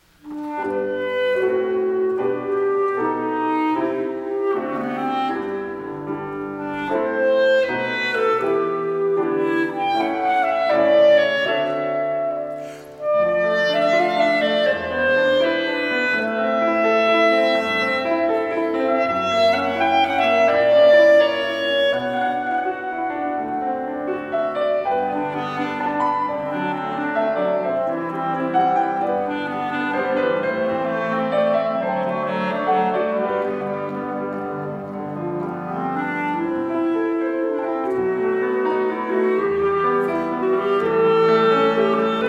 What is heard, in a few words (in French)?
Prelude en sol majeur pour piano et clarinette